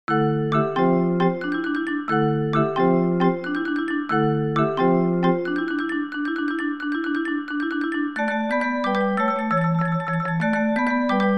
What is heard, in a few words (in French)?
percussions; pieces pour percussions; triade 2 sumotori string